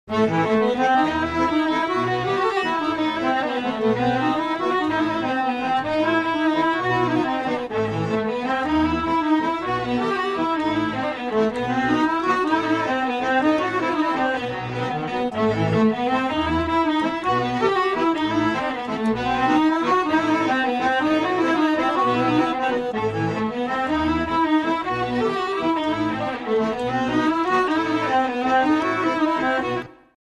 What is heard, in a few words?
Bulgarian Trad